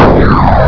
Evil Launch; QuadMire 1.0; Quagmire

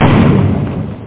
Explosio

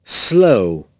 SLOW

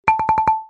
VOTE ROLL

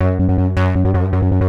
bass, 160bpm; hous-tec; Magix Music Maker & Editor

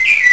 birds; Jump Zampoli

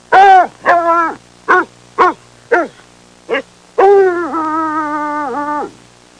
bloodhound barking; pets; Sound Effects for Multimedia - Volume 1

